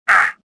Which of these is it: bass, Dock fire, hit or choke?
choke